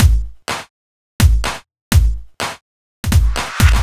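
Disco bass drum snare, Drum Loops 125bpm, Nu Dance Electro; Disco2Disco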